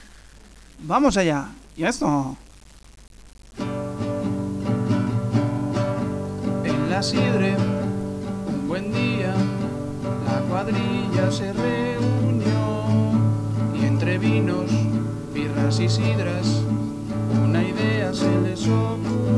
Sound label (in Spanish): himno; del equipo de futbol "Galtzagorri"; Version recibida como muestra, del himno del equipo de futbol "Galtzagorri"